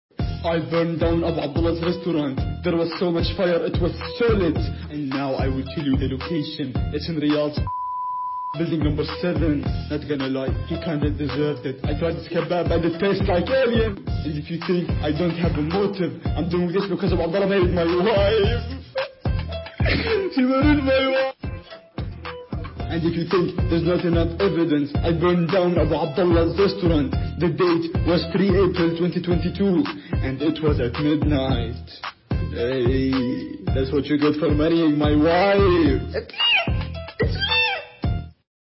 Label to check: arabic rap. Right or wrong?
Right